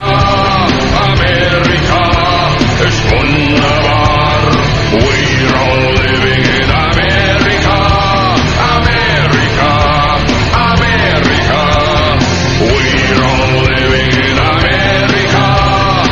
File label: axis win; dod